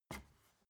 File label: step ladder wood single